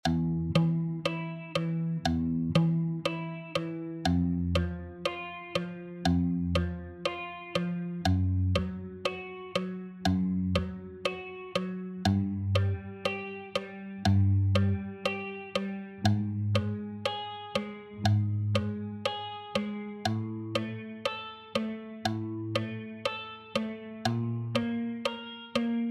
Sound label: octaves across the neck; theory and technique